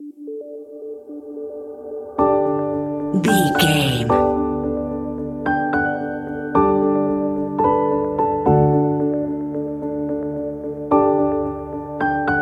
inspiring piano melody

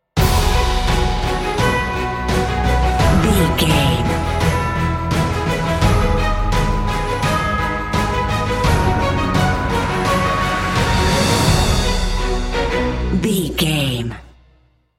cinematic corporate

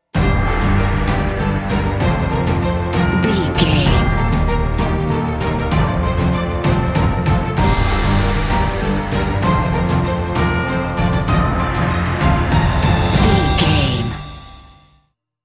epic cinematic

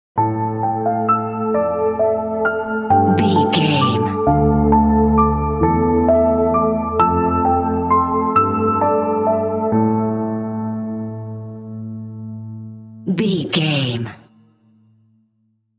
transcend sting

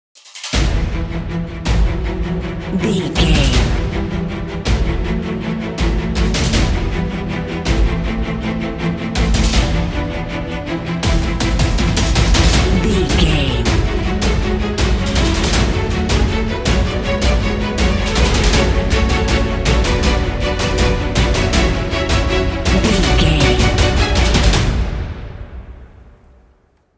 epic action ident

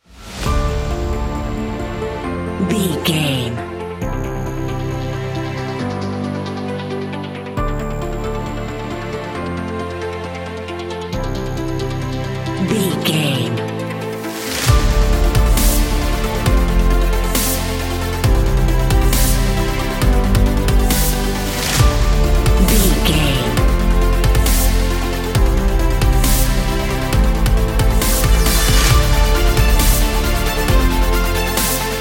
epic electro

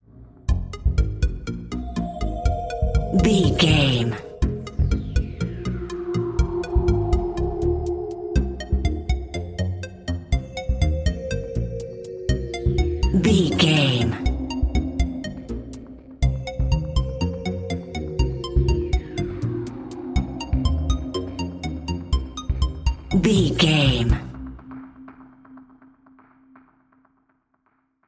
mysterious mission video game

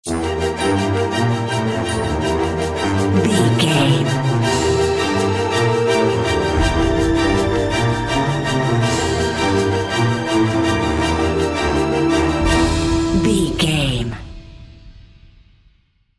undetected spy bumper

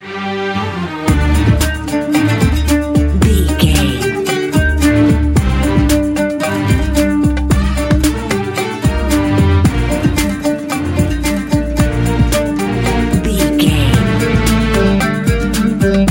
epic eastern adventure stories

